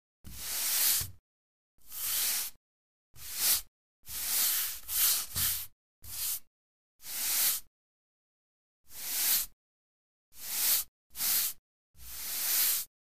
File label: Billiards Brush Tab